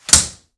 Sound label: METAL CLOSE, Doors; 1000fx